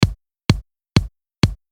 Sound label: kick